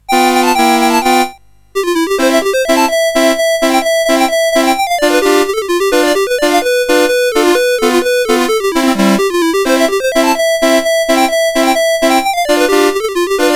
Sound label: Clarinet Polka mus CSG8580R5